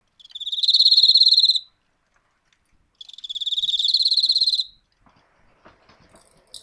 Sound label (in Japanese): enmakoorogi